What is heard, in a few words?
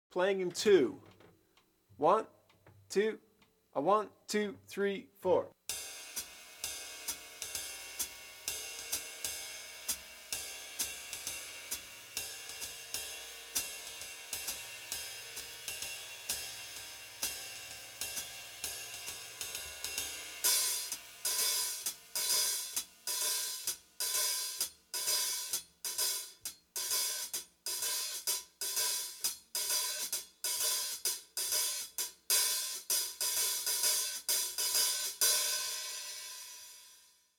Playing in 2, Bop Drumming; The Art Of Bop Drumming; Riley